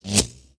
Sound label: tracer pass, guns tracer; World of Tanks Blitz